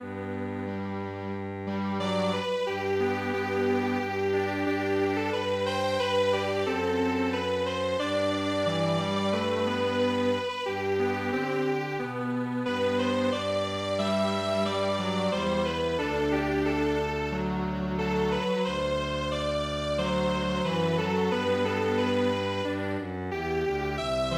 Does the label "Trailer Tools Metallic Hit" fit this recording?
No